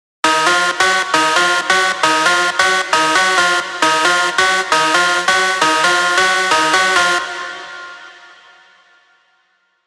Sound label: rave stabs; Quantum Attack; revealed spire rave stabs